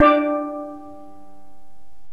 STEEL DRUM

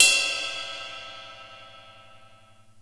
Cup; Zoom RT-234; Kit A

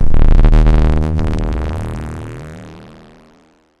Cwejman S1 Bass Swell

